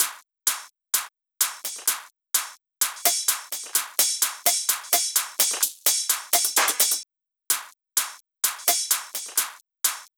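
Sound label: Drum Loop hi-hat Loop, 128BPM; VEC5 Drumloops; VEC5 Multi Loops 128BPM; Loop Elements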